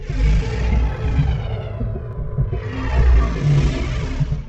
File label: stellarites idle breath; Stellaris; guardians